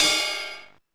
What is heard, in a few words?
KORG MR16; Best Service; Dance Mega Drums